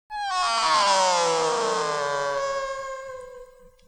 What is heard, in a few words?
Formula 1